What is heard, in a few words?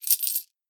coins